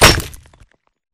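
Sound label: Bullet Light Ballistic Concrete impact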